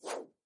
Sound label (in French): flèche début